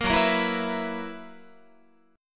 Gb 11th, Guitar

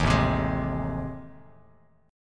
A Minor Major 7th Flat 5th, Bass Standard; strummed; AmM7b5; JGuitar

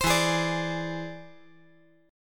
E Augmented 7th E down

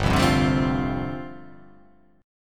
G 7th Suspended 2nd Suspended 4th

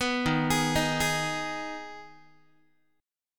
Eb Suspended 4th Sharp 5th, Guitar; Ebsus4#5 arpeggiated; 14,11,11,13,12,x; JGuitar

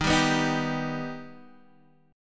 B Augmented D sharp

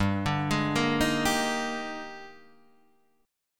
Eb Major 11th G, guitar

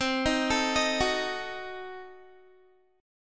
C Diminished 7th, Guitar; arpeggiated; Cdim7; 20,18,19,20,18,x; 4E,4A,5D,5G,5C,6F

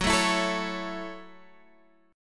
Gb Minor 7th, Guitar Standard; strummed; JGuitar

